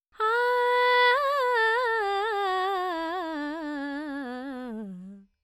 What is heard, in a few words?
Dry 4-4 G 100, Vocals Male